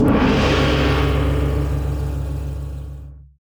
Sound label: Gong